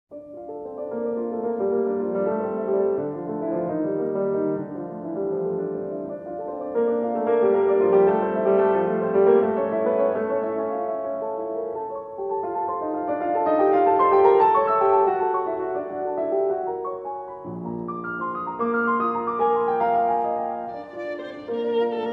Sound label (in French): Sonate Pour Violon et Piano - Mvt. 1. Allegretto